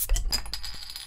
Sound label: bottle open